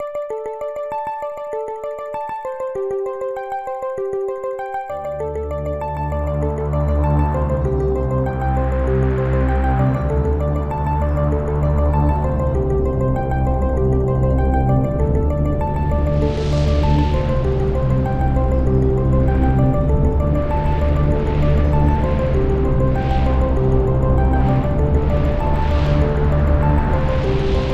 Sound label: Night Winds, sci fi